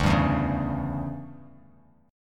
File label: D Major 7th Suspended 2nd Suspended 4th, Bass Standard; strummed